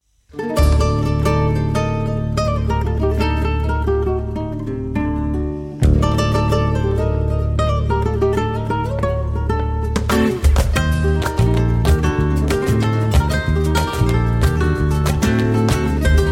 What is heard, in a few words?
tangos